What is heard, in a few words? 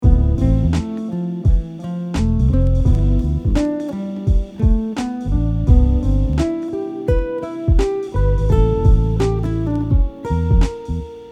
Melody example, Chord Tone melody example 2 with scale notes, guitar; Scale notes are highlighted in blue